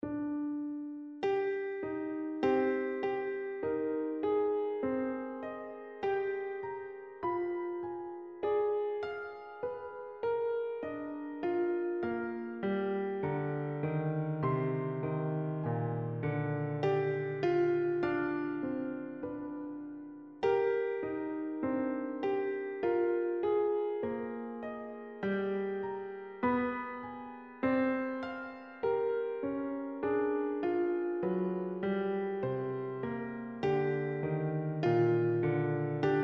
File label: piano; Katarakt records